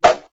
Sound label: zap lightbulb fall